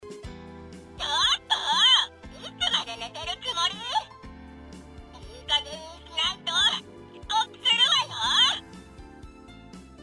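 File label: normal music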